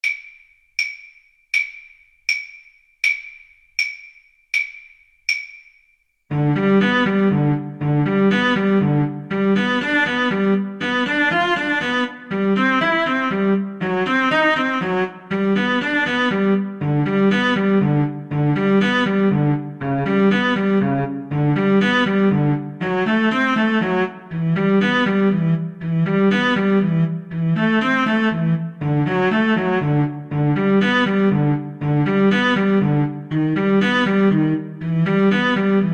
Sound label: Viola Cello Duet